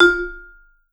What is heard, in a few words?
F3, Vibes; Sampleheads; Dave Samuels; Marimba & Vibes